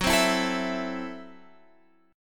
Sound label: Gb Minor 7th Flat 5th Gb, Guitar Half Step Down